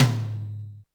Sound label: Yamaha RM 50 Toms